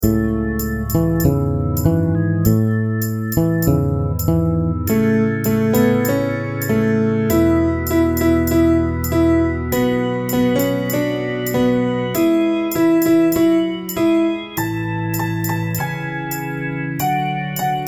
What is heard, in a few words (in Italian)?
filastrocca vocali base